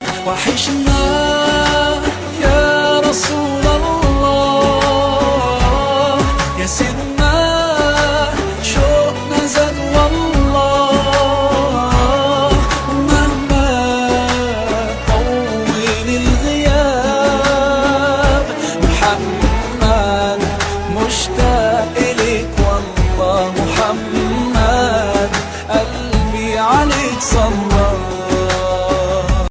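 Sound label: English, Islamic ringtone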